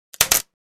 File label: Taser snapping electricity hit